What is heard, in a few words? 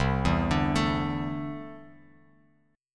C 6th Add 9th C down Bass Standard; C6add9 arpeggiated